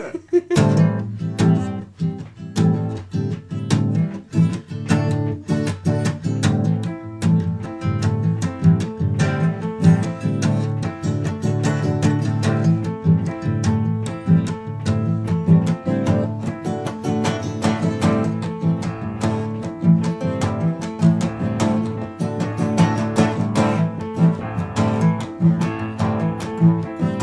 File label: piano